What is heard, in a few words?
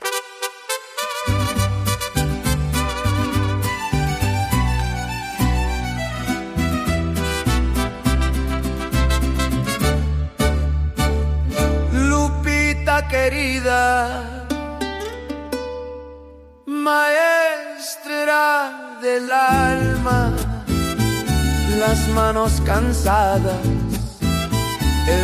mariachi mama